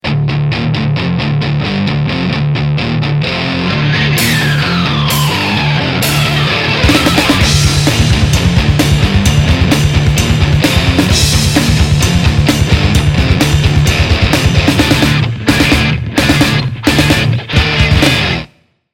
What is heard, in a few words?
Epic Metal Riff